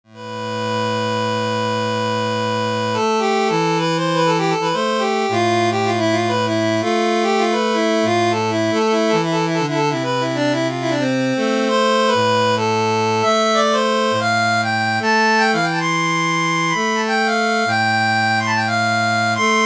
Pipe organ vib; Pipe Organ vibrato; dry instruments; MIDI file of a Bach piece

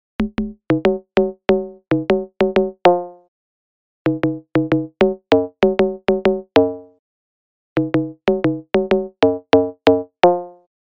sidekick perc

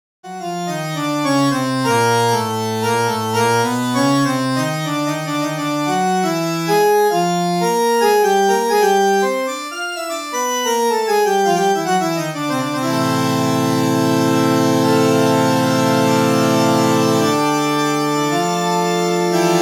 MicrOrgan fat dry, Reeds

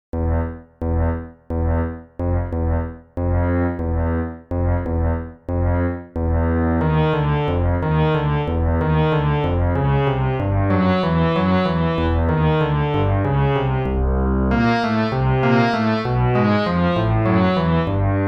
Big air Brassorgan reverb; Reeds; impro

